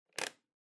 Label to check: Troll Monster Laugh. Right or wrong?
Wrong